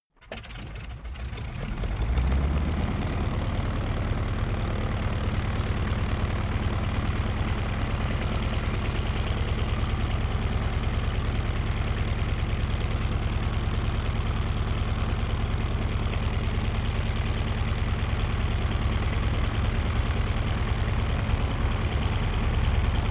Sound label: propeller plane ju52 engine start idle